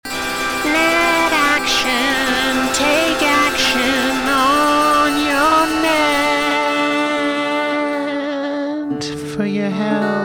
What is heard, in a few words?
horrible jingles; netaction